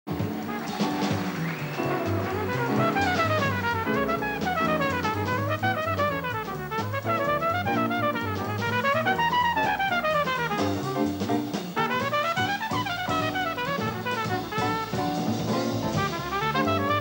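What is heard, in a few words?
trumpet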